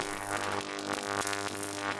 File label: glitch distortion